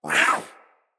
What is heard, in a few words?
Feral Vocal Attack Short; Catbrow